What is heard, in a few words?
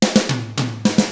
109 POP; ILIO; Double Platinum Drums 1